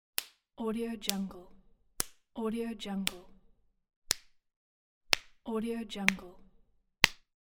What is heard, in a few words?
light slap